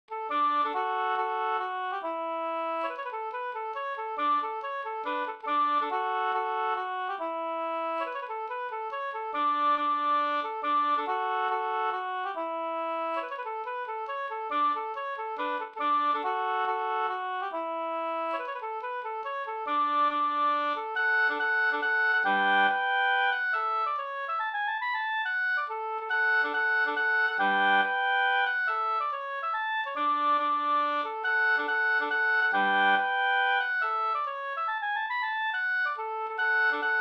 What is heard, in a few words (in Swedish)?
mazurka